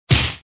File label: punch